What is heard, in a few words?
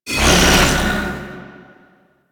Fusion Pillar Cast; Warframe; Warframes; Qorvex